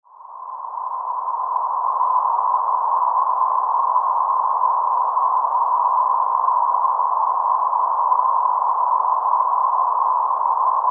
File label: 1000 Hz Narrow Band Noise; Tinnitus; Tinnitus Cutter